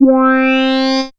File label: syn c, Dance Synths, Acid Bubble; ProSamples vol.37; Best Service